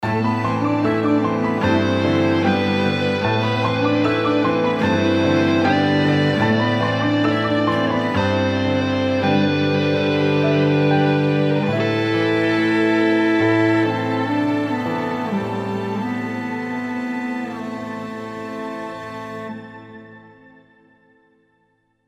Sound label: bumper